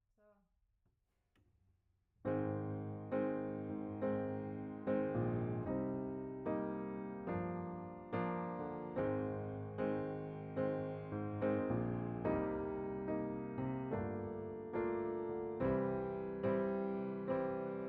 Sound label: Piano